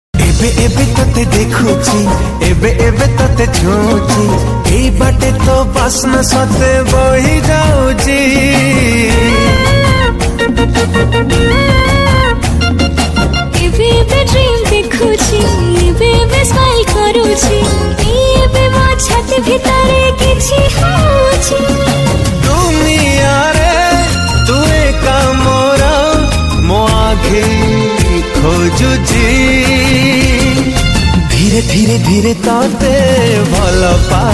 New Odia Song Ringtone

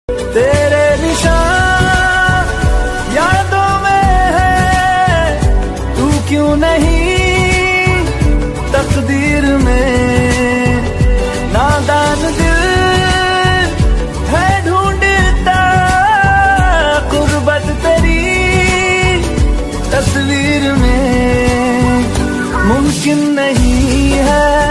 Slowed, Sad Ringtone

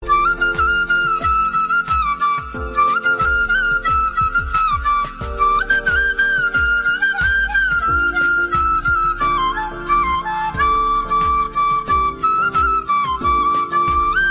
Flute Version Ringtone

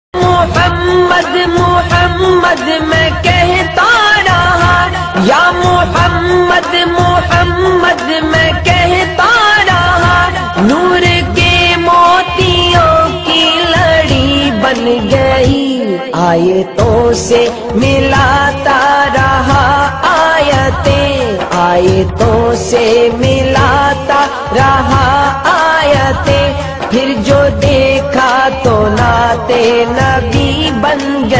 New Naat Sharif Ringtone

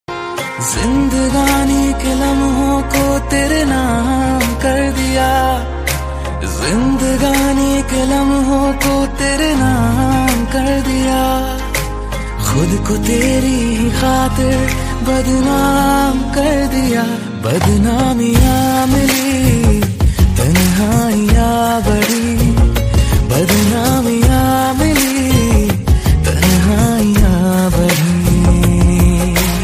Love Song Ringtone

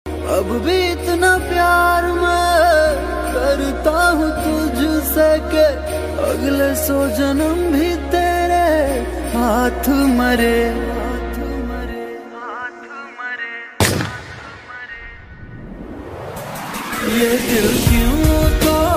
Sad Hindi Song Ringtone

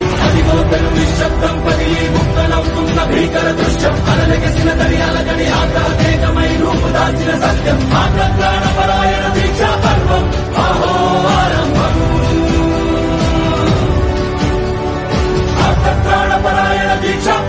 Instrumental Ringtone

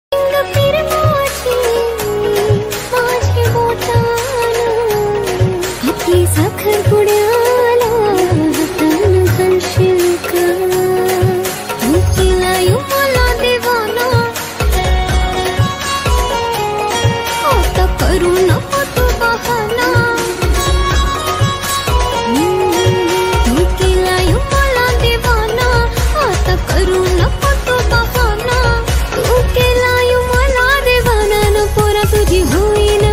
Marathi Ringtone

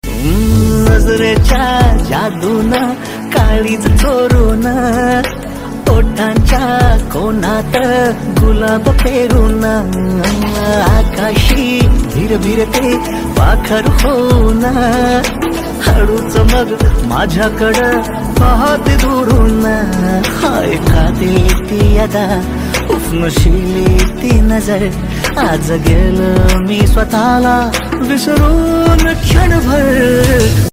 Song Marathi Ringtone

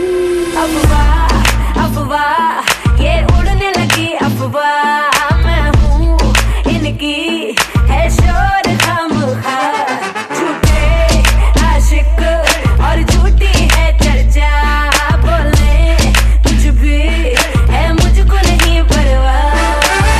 New Romantic Hindi Song Ringtone

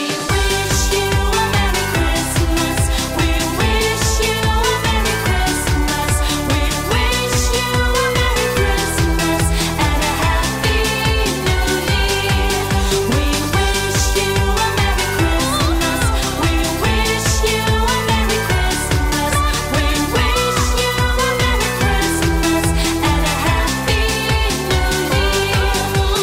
We Wish You A Merry Christmas Ringtone

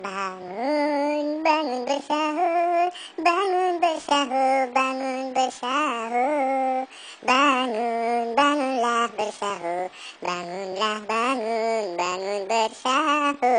Sahur Ringtone

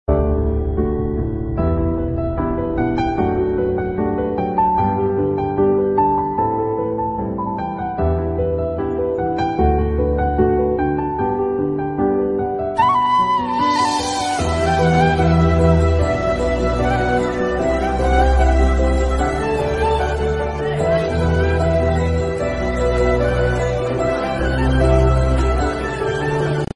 Night Moon Bgm Ringtone; ringtones

